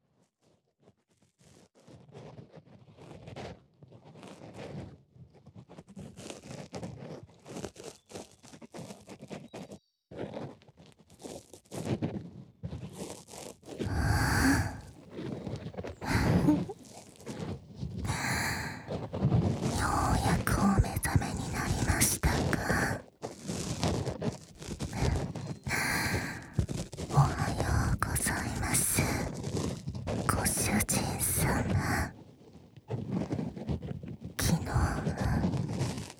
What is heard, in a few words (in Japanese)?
耳かき, ASMR; とある休日～朝いちばんの気持ちイイご奉仕～（耳かき＆フェラチオ）